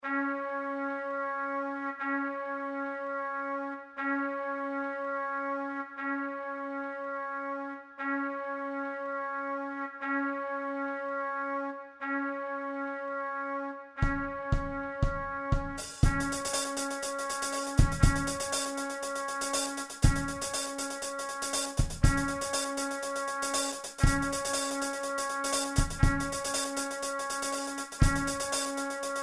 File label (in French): Trompette Batterie